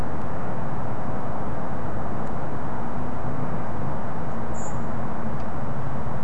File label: thrush sp; nocturnal flight call project in beijing autumn 2021